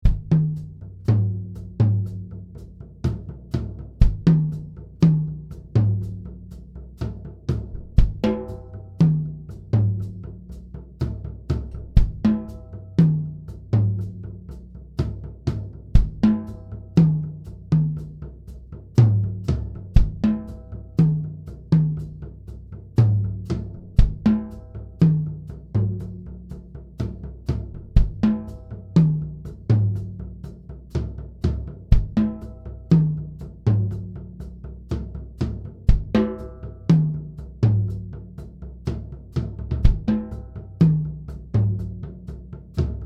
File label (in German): Tom Toms ohne Overheads